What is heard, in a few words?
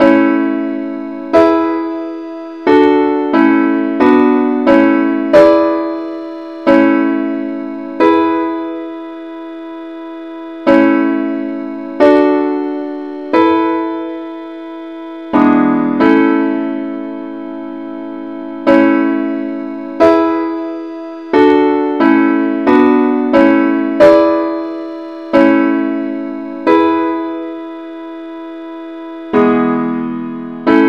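simple Accompaniment key a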